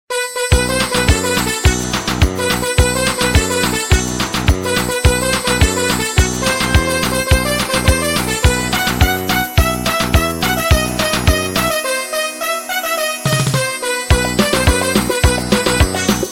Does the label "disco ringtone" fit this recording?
Yes